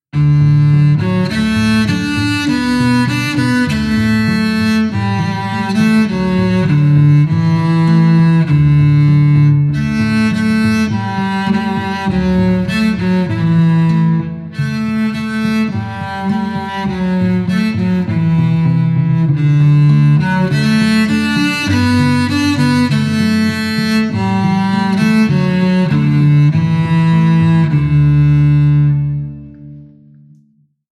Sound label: no bass bar, violins